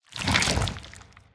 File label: slither short; smite; scylla